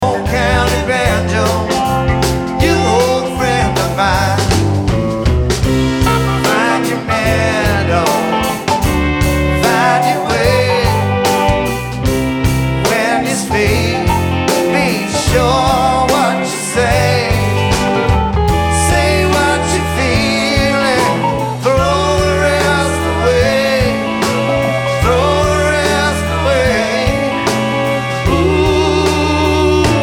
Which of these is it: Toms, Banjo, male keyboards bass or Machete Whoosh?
Banjo